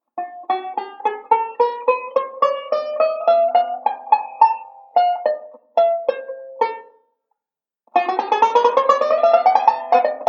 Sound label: Chromatic Run, banjo; Beyond Chord Melody Volume II